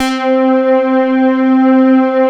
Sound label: OB LOW P